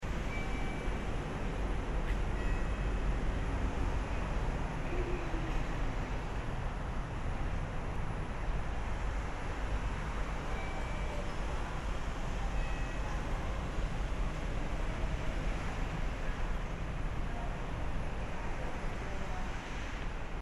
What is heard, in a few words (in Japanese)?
駅ホームの環境音